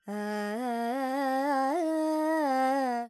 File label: Pentatonic, Vocals, Carnatic Female, Alaps, Dry